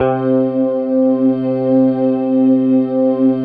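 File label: synth Morpheus; Sound & Vision - Gigapack II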